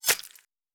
Stab; Survival Game Sounds - Lite Edition; Combat and Hunting

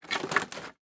Wood Handle; Survival Game Sounds - Lite Edition; Environment

